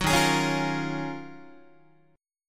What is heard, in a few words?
D sharp Minor 11th D sharp Guitar, chord; strummed; JGuitar